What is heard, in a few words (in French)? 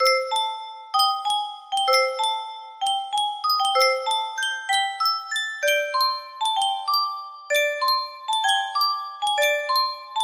yunsheng boite a musique